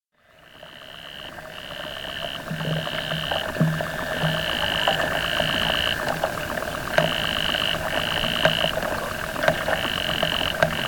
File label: subaqua soundscape donatussee; klaus osterwald